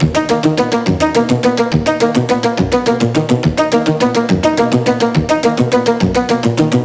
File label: Riddim Synth Loop D# (140); Striker; Ghosthack; Riddim Essentials 2020